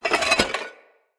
Clay Shuffle Texture; Ambience; Soul Plane; Vendor

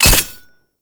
Wrist Gun Low Clip Mech; Weapons; Warframe; Tenno

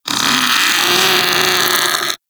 Vocal Death, Infested; Splitter Split Top; Grey Strain

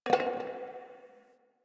Garbage Metal Can; Warframe; Ambience; Nineteen Ninety Nine

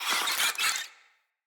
Infested Ashen Vocal Attack

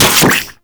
Venom Tick; Warframe; Saryn

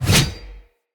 Cleaver Whoosh, Swords; Weapons; Player; Bromius Cleaver

